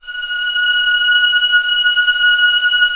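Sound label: STR C0 FF, strings; Sound On Sound - Peter Siedleczek's Orchestra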